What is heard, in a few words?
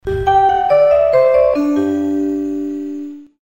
Alert; Electra